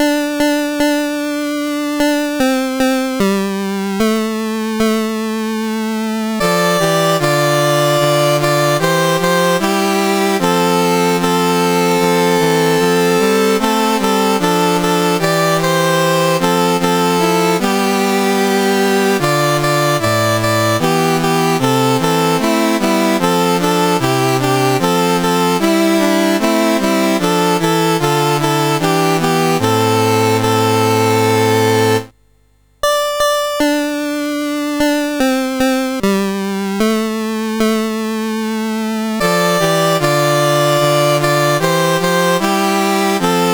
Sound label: CSG8580R5, National Anthems; Kenya